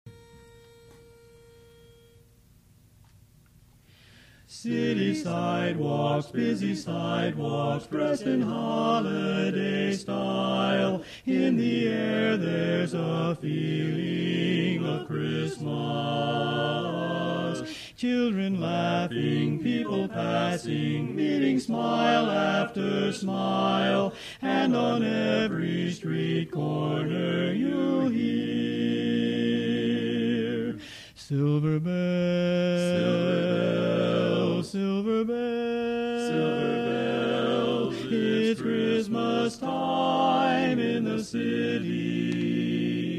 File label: Lead; Christmas; learning tracks